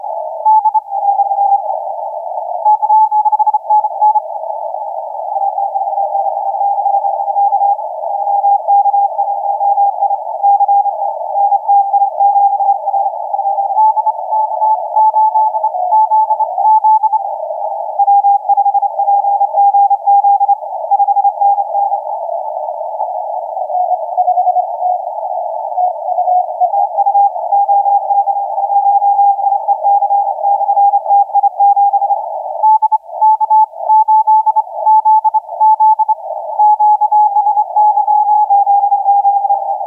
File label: 17m CW